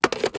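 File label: sniper reload empty mag in; gmod; iw8; xmike109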